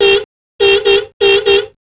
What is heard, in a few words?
Car Horn